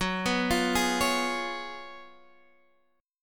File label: Gb Major 7th Suspended 2nd Suspended 4th, down, Standard; arpeggiated; JGuitar